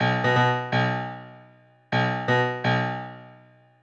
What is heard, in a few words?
Disco Fever